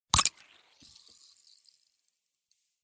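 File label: hissing of foam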